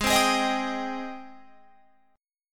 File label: Ab Major 7th Ab down Guitar Open F; AbM7 strummed